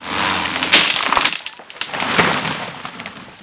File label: log break; objects